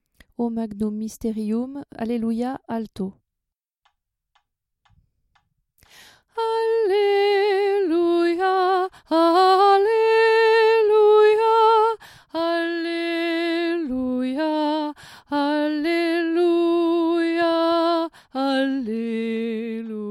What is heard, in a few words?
O Magnum Mysterium Alleluia Alto